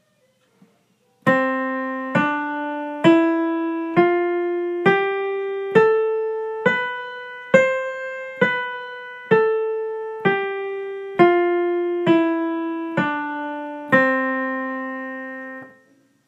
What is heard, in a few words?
major scale